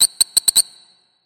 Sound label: cry